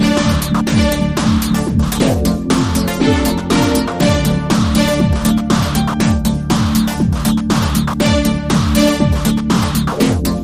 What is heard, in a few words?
Epic Robot War